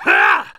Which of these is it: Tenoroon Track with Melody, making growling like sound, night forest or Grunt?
Grunt